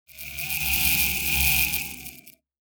utilities electric layer

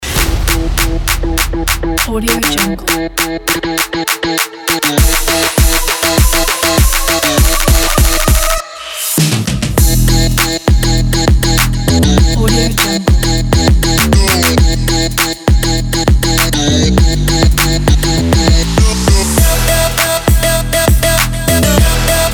trap beat

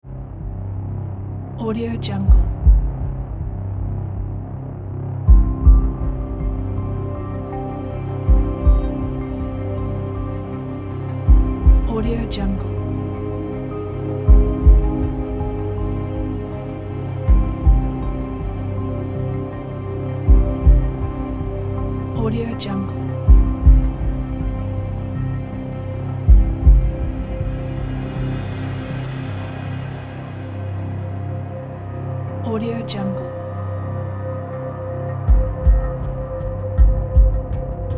scientific and medical documentary

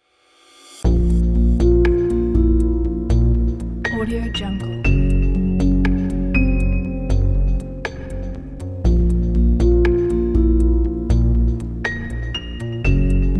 suspense investigation

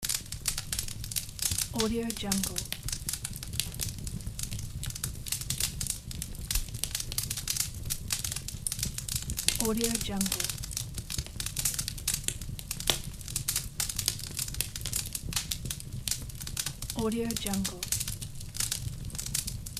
fireplace

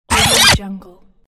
scratch

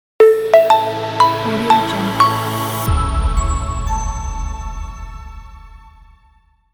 corporate logo

